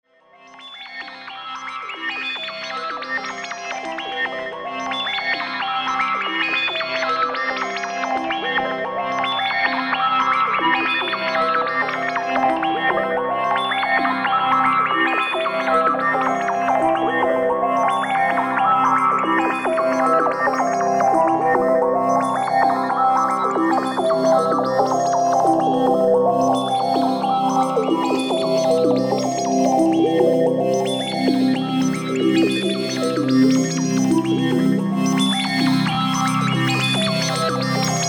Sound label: drone song